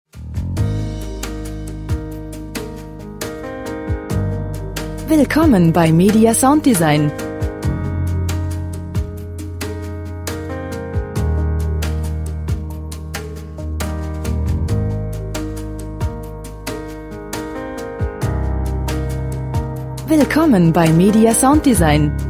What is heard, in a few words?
jazz